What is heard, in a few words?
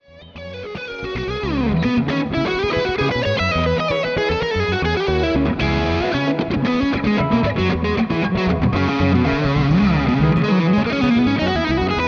Drum sample